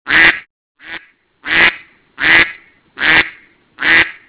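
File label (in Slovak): kacica, duck; zvierata; domace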